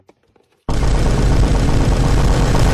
machine gun sounds

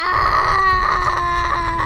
ahhhhhhhhh anime

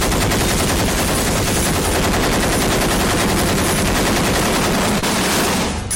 ak47 bass boosted; ak47 ses

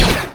first order blaster

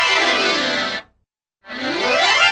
accordion slide down and up

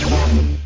energy generator bounce; angry birds star wars

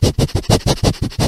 dog sniff meme

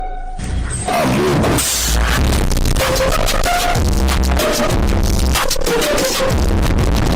bass boost; amogus

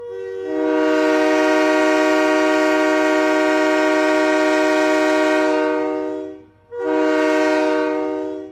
long and short diesel train horns

